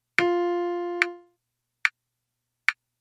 First Pitch; Gospel Acclamation

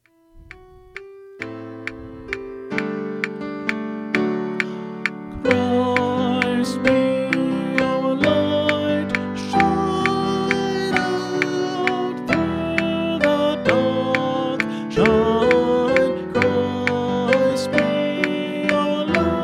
Refrain Descant; St. Columba Choir Practice Tracks

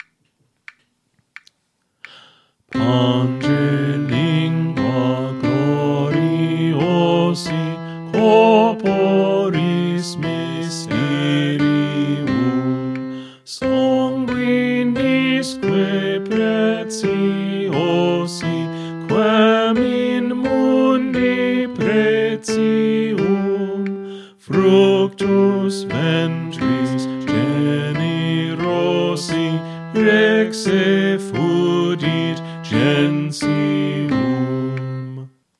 Soprano; Pange, Lingua, Gloriosi; St. Columba Choir Practice Tracks

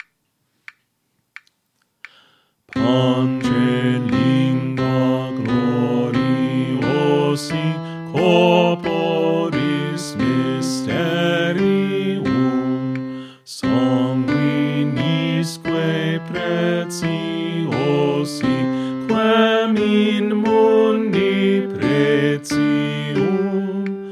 Alto; Pange, Lingua, Gloriosi; St. Columba Choir Practice Tracks

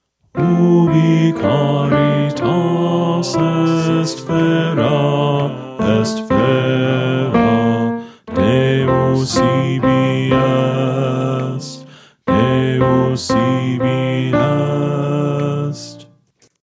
Alto

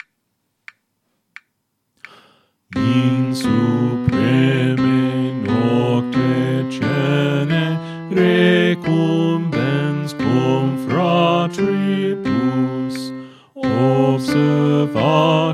Bass; Pange, Lingua, Gloriosi; St. Columba Choir Practice Tracks

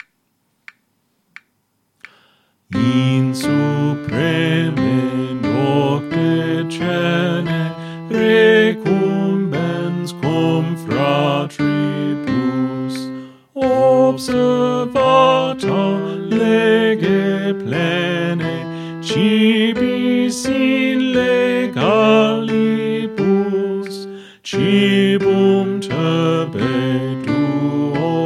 Soprano; Pange, Lingua, Gloriosi; St. Columba Choir Practice Tracks

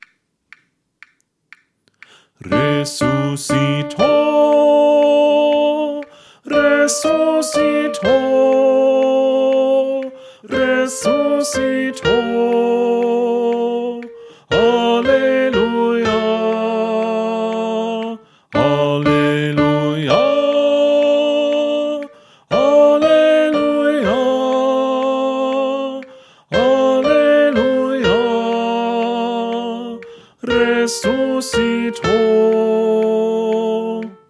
Refrain Soprano

